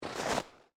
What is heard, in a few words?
Snow Single Slow, Footsteps; BlastWave